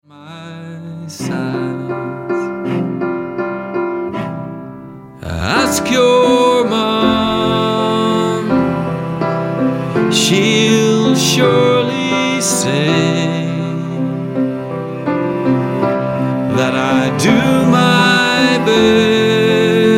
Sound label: Lullaby